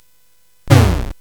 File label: Dark Castle, CSG8580R5